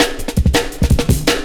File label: Drum Loops, Jungle